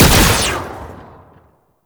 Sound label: Laser Fire; Enemies; Weapons; Warframe; Tau; Sentient Dactolyst